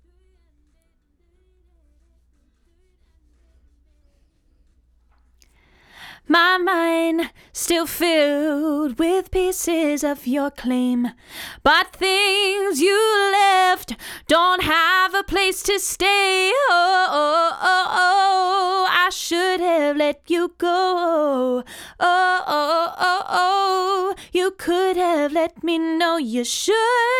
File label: Vox